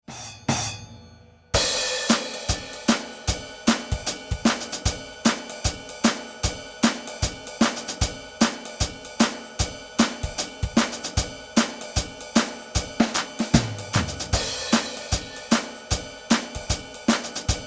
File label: pop song drums